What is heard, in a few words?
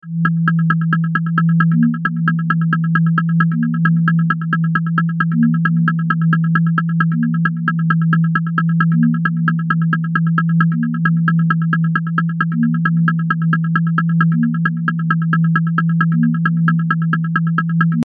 Groove